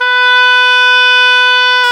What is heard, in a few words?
OBOE, Orchestral Winds, Oboe mf f